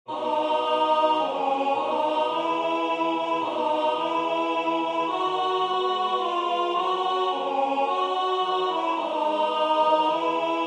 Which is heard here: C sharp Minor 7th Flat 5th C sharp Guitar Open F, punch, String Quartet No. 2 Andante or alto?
alto